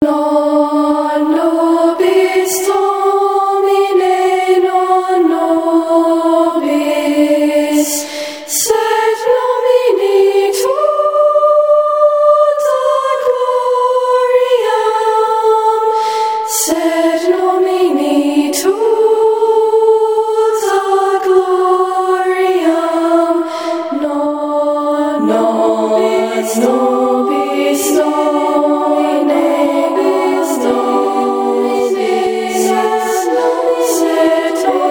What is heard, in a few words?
one voice a whole treble choir with pitch problems